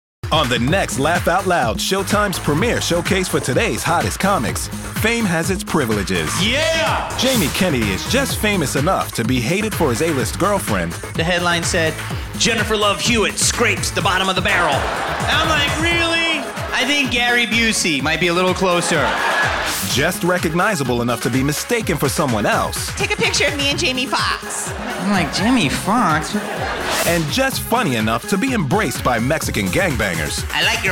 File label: laugh out loud